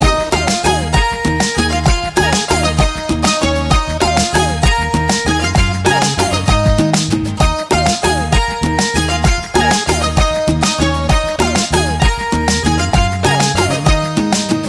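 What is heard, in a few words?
Love background music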